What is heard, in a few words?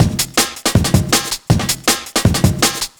Drum Loops, 160; TwoAndTwenty; M8; Electronisounds